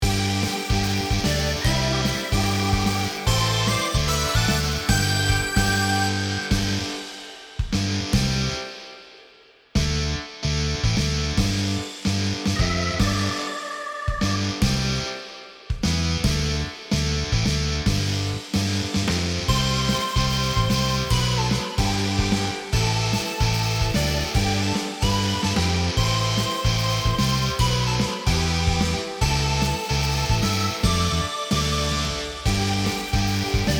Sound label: Instrumental